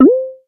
Glass Up; Timecrest Music

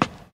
stairs step; Golden Crayon Sounds

